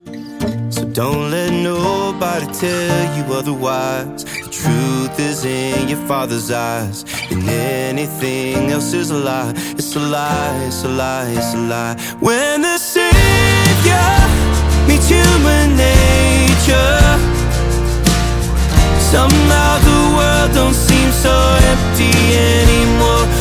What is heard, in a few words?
acoustic